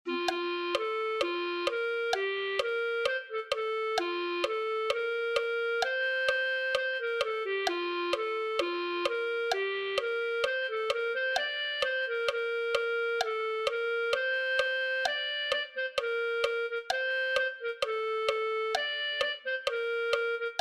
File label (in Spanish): clarinete